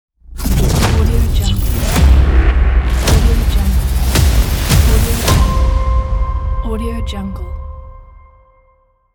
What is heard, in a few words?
glitch logo intro; annafokova